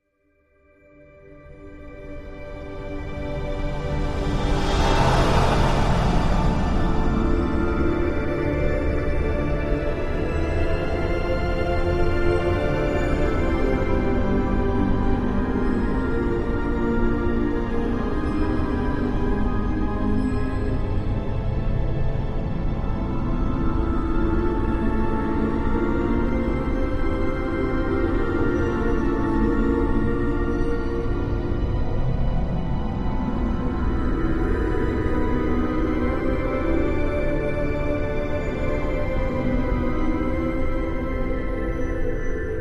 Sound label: Music Eerie Twinkle, chimes